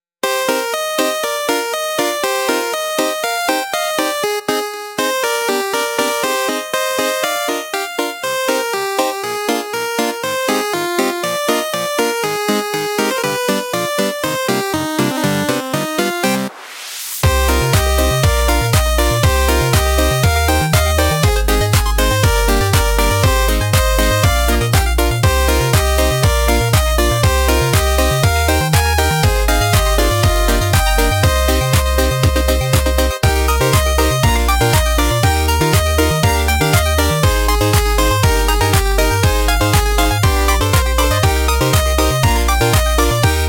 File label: title screen, music; menu